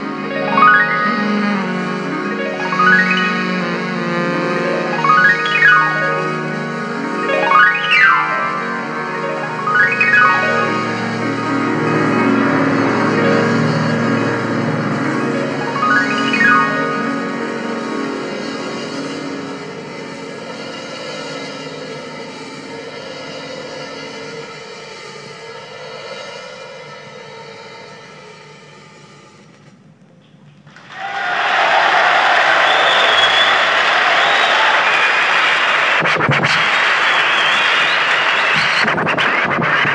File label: homemade scratching applause